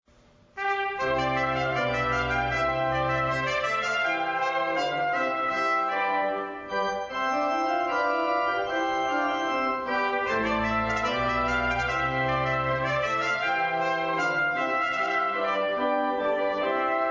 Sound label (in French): Air De Trompette